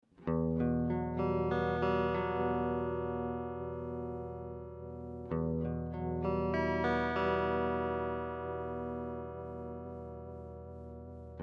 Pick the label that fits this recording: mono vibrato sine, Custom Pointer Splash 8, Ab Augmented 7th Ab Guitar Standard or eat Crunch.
mono vibrato sine